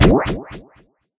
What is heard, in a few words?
hitsound vortex